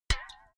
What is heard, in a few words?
filled can hit hard ringing dripping